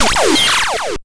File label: Blast; Side Arms